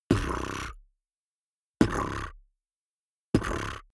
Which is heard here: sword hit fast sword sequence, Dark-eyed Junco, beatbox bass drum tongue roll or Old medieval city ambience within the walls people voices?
beatbox bass drum tongue roll